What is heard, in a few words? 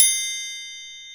Triangle Open